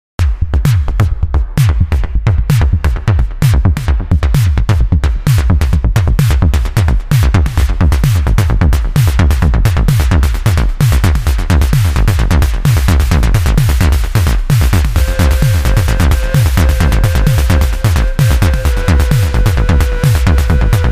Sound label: DRUM ARP BASS FX